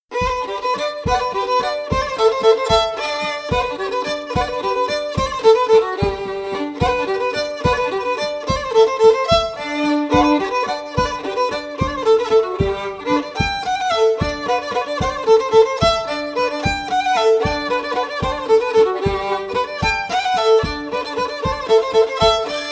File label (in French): Bourree d Auvergne, piano